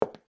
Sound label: Concrete Heels Walk; コンクリート ヒール 歩行音